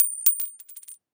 coin

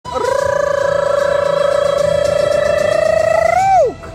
rrrrrooook

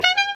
horn; hornblasters

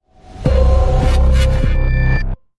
transition

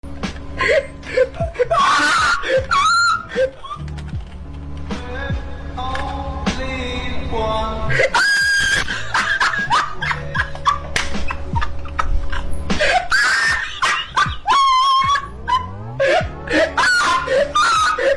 funny laugh

